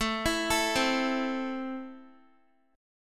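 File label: A Minor, Guitar, chord strum; arpeggiated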